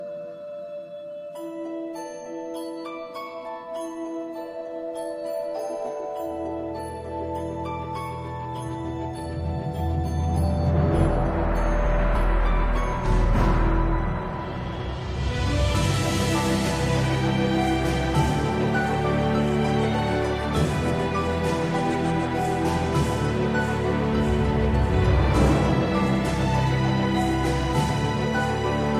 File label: Login Music